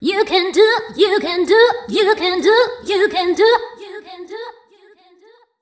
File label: fem mel, Vocals; Mutekki Media - Diamond Vocals 2